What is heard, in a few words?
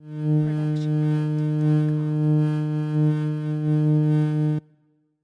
electricity hum medium; SoundsCrate